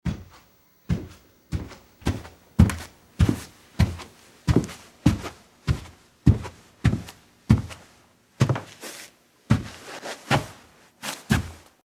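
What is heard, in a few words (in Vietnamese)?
Tiếng bước chân đi lên cầu thang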